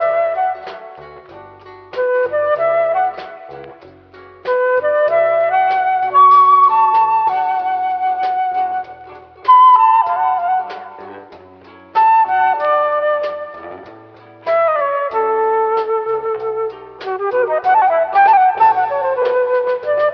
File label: flute and guitar, Loops Phonky